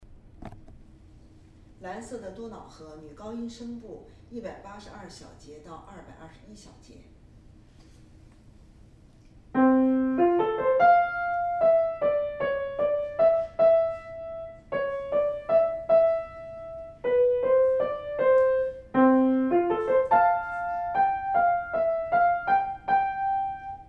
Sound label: bluedanube soprano